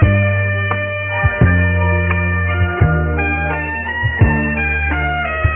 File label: horns, Phonky; loops